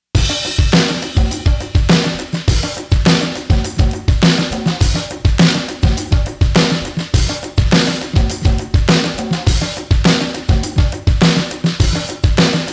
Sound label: Drums SN